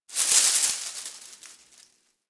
Derelict Tree Shake; Warframe; Orokin Derelict

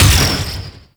Ship Dual Cannon Shot; Crp Moa Dual Cannon

